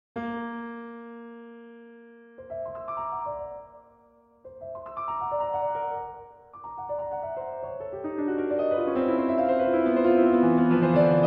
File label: Late Piano Works